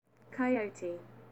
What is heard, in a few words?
coyote